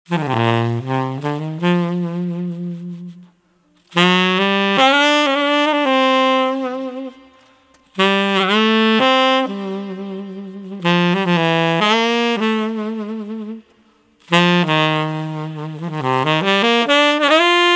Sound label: lonley sax